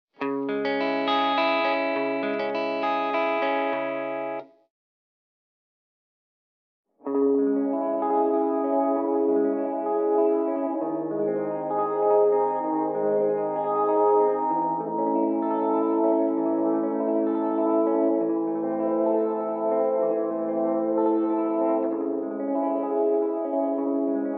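Vintage Oil Can Echo, Fast Time, high Regen and 100% wet Mix create a thick, dark and warbly chorus-like lo-fi tone that slowly modulates and reverberates into itself.; New Vegas Radio; Olivera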